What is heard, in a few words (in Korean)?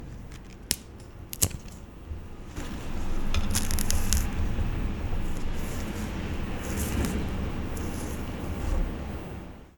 바나나꺾고까는소리